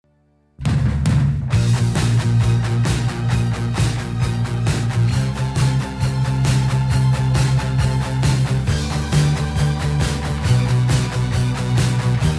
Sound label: fanfare; kajak